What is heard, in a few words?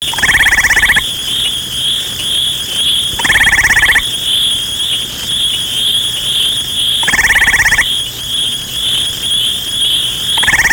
eastern gray